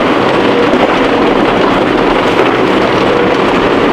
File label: ROAD NOIS; crc2005